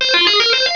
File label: 6 sounds; Goldwave